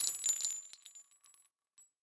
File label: revolver eject shells; Rust